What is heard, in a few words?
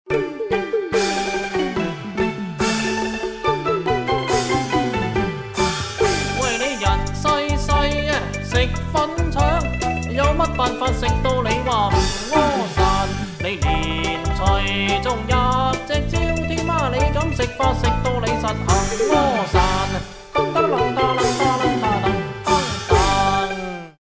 ringtone